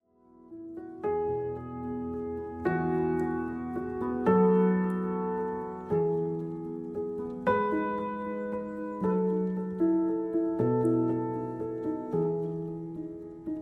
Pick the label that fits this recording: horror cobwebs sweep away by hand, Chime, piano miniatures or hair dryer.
piano miniatures